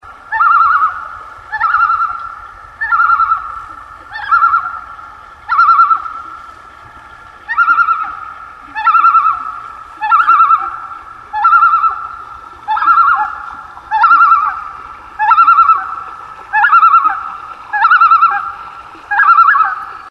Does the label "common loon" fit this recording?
Yes